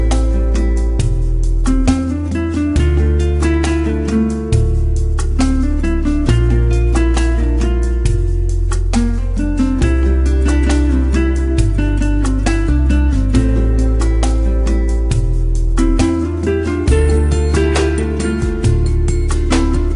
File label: Spanish guitar